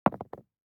DICE on Neoprene, Throw and Roll, Standard, 1 One Die; Samples; ArticulatedSounds; DICE Sfx Party (Extended)